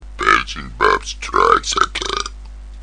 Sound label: strikes, belch